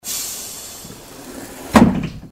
door sound, bus; tdmcars

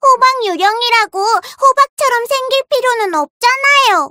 battlecry; voice packs

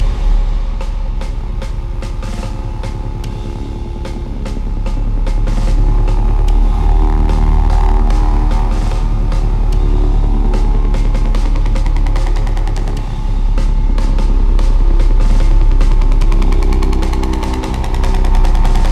spawn chaos

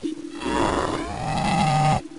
baby alert; cof

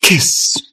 kiss

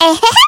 laugh short; voice packs

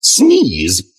sneeze

